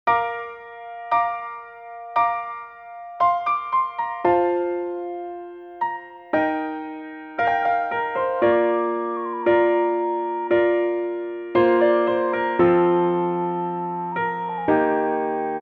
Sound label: text tone